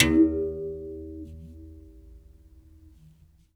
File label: Tar, One Shots, World Percussion; Sony CS